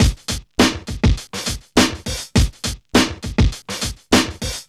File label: FAT BEAT; Zero-G; Funky Elements